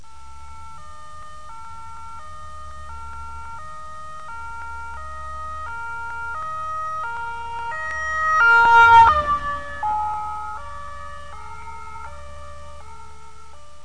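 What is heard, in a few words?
ambulance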